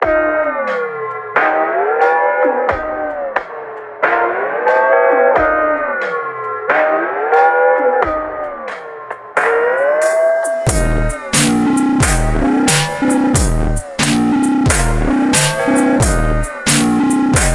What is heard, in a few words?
throw up